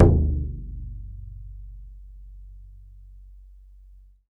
BODHRAN, Celtic Percussion

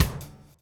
DOWN, DOWN KIT 78

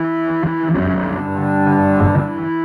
Earth Drop, Electric Guitar; Sonic Foundry (Sony Creative Software); Friendly Fire; Serpent

